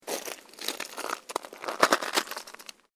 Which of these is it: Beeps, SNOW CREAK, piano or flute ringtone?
SNOW CREAK